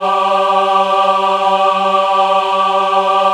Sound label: G3 MW AH, Choir; USB Soundscan vol.28; Acoustic & Synth; AKAI